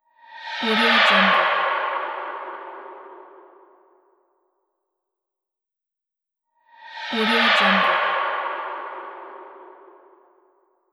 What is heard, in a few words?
space object flying by; mountainaudio